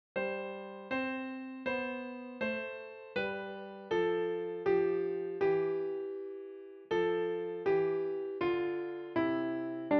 Vom Himmel hoch da komm ich her Klav, Klavier; deutsches Weihnachtslied für Klavier solo, inklusive Fingersätze, mit Vorspiel

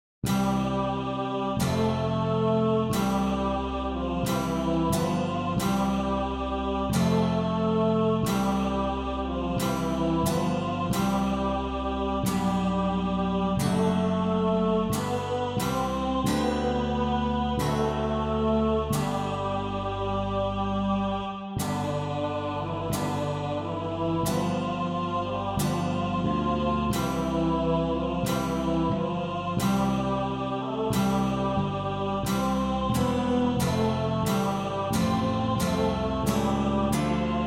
O du froehliche

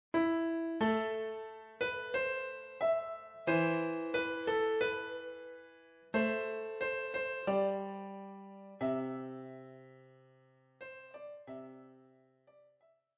Maria durch ein Dornwald ging, Klavier solo; Schwierigkeitsgrad 2, leicht

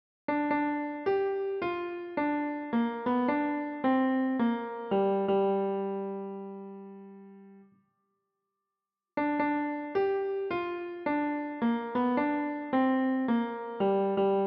Klavier, für Klavier solo; leicht; Schwierigkeitsgrad 1

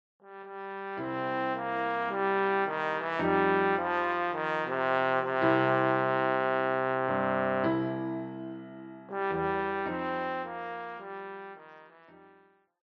Posaune, Klavierbegleitung

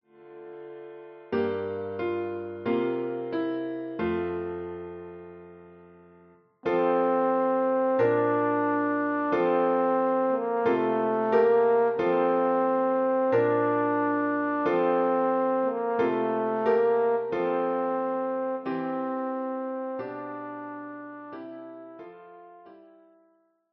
O du fröhliche

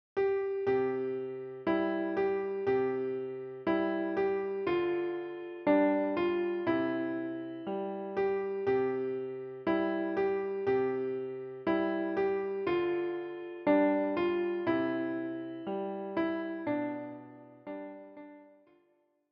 Ihr Kinderlein kommet Klavier; Weihnachtslied für Klavier, leicht; Ihr Kinderlein kommet - für Klavier, leicht mit Aufnahme

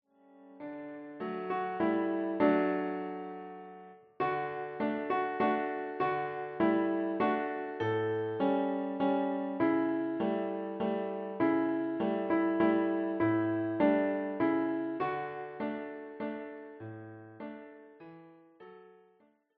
Suesser die Glocken nie klingen, Klavier

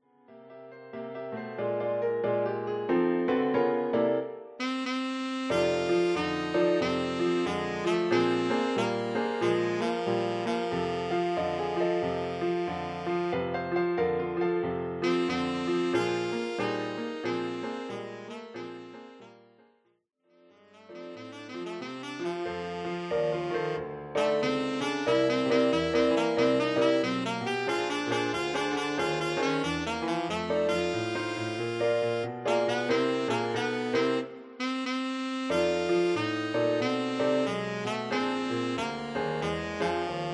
Altsaxophon, Klavierbegleitung

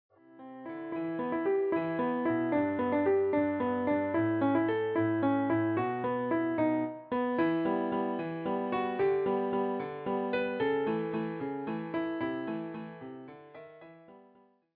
The House of the Rising Sun, Klavier

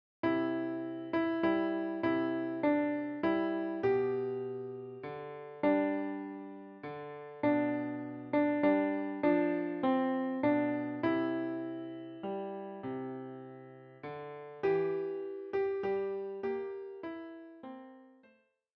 Süßer die Glocken nie klingen Klavier

